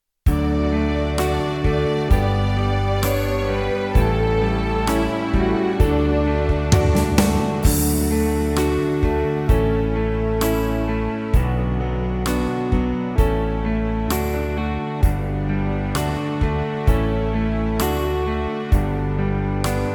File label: BACKING; Easy Bassoon Christmas Carols Book One